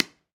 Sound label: Pickelball Paddle Hit Ground Light, Ball Collision